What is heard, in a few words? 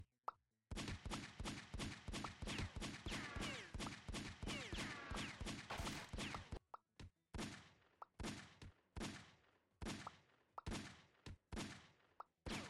revolver shot